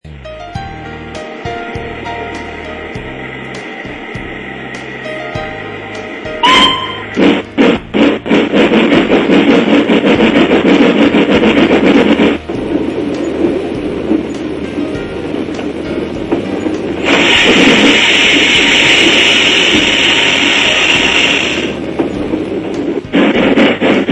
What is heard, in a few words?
Kastendampflok, Box Steam Loco